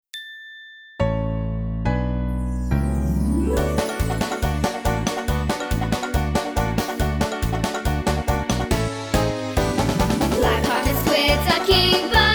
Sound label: Song; Piratical Style